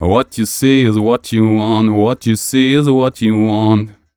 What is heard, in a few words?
m 127 mel a, Vocals